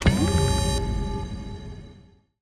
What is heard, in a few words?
Mecha SKILL cast; Cielonos; Wwise